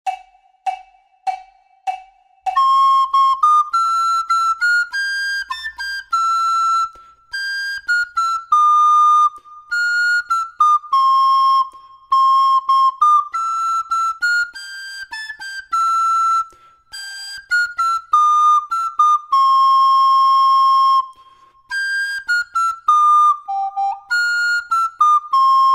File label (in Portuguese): DUETO FLAUTA; Long Long Ago